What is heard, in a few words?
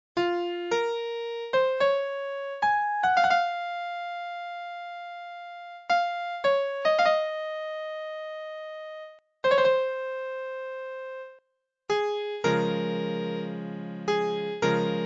Piano